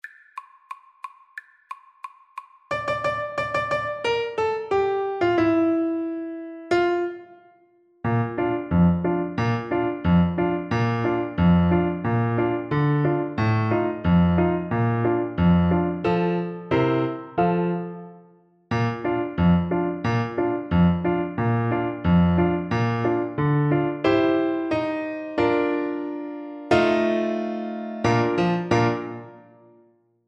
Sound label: march, trombone